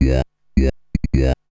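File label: synth bass, drum and bass, 160 bpm, C; eJay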